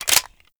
Heavy Weapon Clip Out, reload

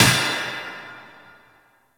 Worker Hammer Impact; Ambience; Props; Warframe; Venus Open World; Venus Solaris United

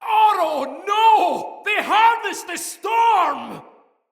Fearful Cry Afar, Soldier Male; Dendrit

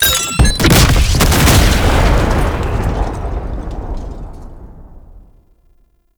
Exterminate Cache Explosion; Warframe; Nineteeen Ninety Nine

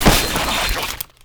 Military Backpack Destroy; Objects; Storage Container; Warframe

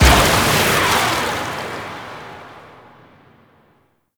Pirate Cannon Blast; Warframe

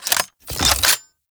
Shotgun Reload; Warframe; Tenno; Alchemist

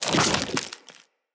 Root Spider Mother Foley Leg Swipe, Spiders

